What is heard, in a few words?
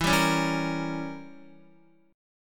E Diminished 7th E, Guitar; Edim7 strummed; JGuitar